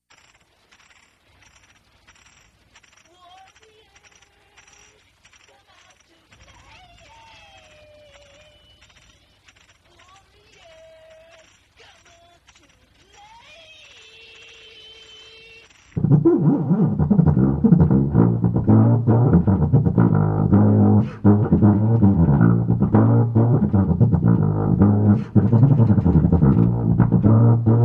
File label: Tuba solo